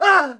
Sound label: plyr ht; quake; halo; hurt